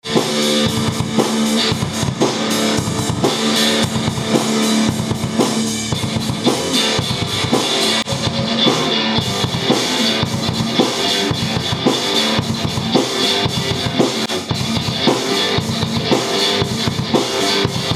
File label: Heavy On The Metal, jam